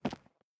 Footstep Concrete Walking; gamerip; The Looker

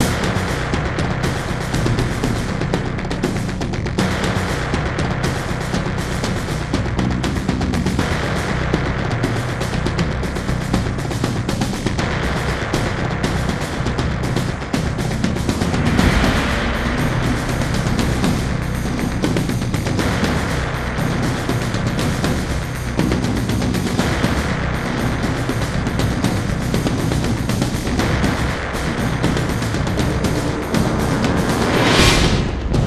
PERC BASS LP; transformers war for cybertron gamerip

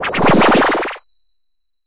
Param up

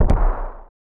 Gun; ryukiheidan danzarubu; snes

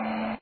Pop boosting; papas cupcakeria online windows gamerip 2013

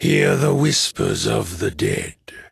Taunt; Hero Mortemis

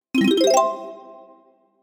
Find Watermelon Piece; Donkey Kong 64

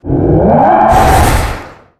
Skeleton; Zenonzard

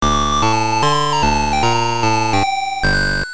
you lose; Jantei Monogatari 2 Uchu Tantei Divan Shutsudo Hen; PC Engine CD

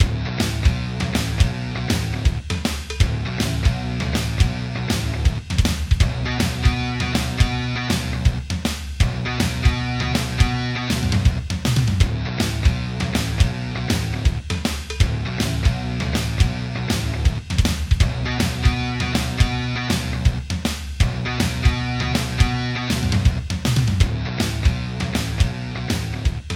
3d Music

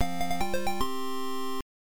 Round Clear Music; Bosconian; MSX

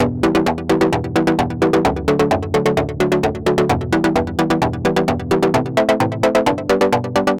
Galloping Disco; Wild Animal Racing

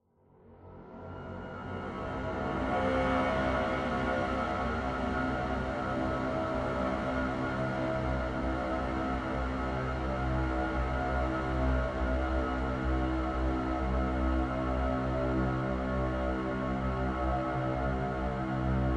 Suspence Pad, Low Intensity; apex legends season 12

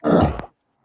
Fishmen Injure; we are the dwarves gamerip 2016

